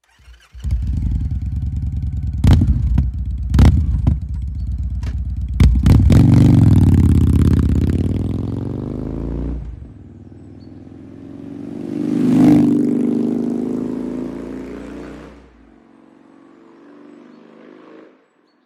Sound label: victory hyde